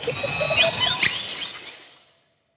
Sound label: BABY MOLOCH idle